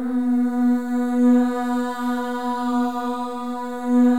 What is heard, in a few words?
MOVING V3, Symphony of Voices; Spectrasonics